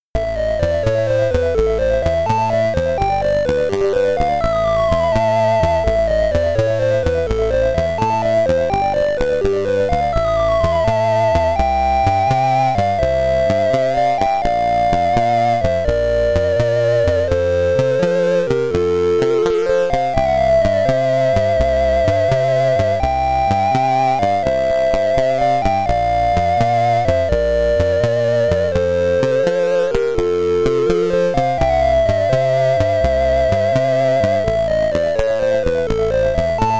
Boss Fight 2 (SID Stereo), Commodore 64; Space Harrier

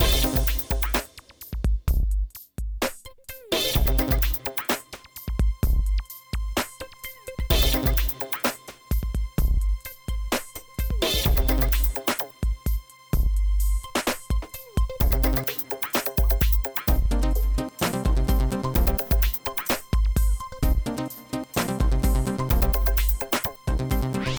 Obstacle Challenge Music

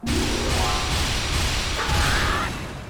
Harp - Special Attack; Hyrule Warriors Definitive Edition; Sheik's Harp

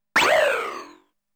Firing Sound; Namco Game Sound Express Vol.1; Valkyrie no Densetsu

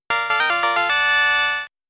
Extend Sound; The Tower of Druaga

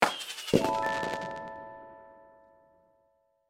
Firework Explosion; my cute commissar 2020 gamerip

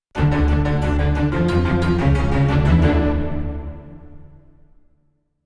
Combat Out; Novigrad

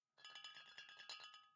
Bottle Shake; The Town

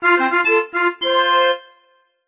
Task Completed Small; Pocket Arcade Story DX

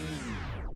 Shield Off; doodle jump 2; android

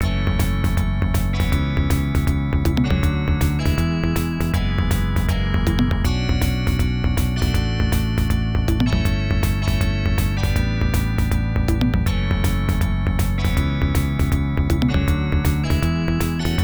Goal; super sprint; atari system 2; arcade

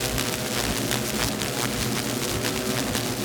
laser rays

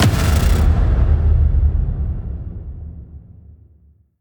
Piano Stop Impact; Devour; The Town; Intro Sequence

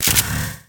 gun

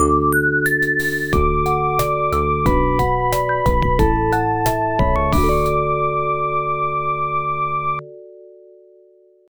Logo; Vanslug X Mission; IBM PC AT; Samsung Logo

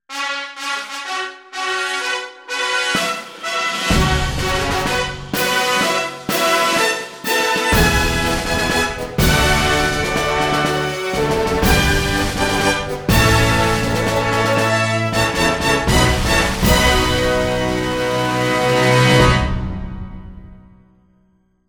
Fanfare Hongkong; Champion Jockey: G1 Jockey & Gallop Racer; Xbox 360

